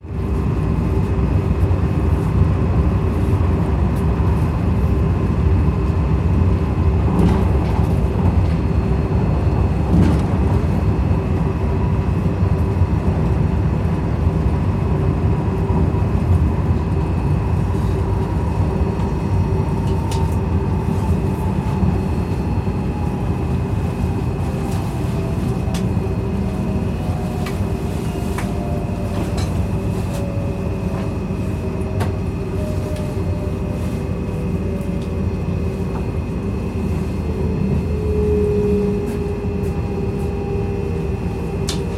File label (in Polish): Pociąg PKP zbliża się do stacji; Dzwięki Otoczenia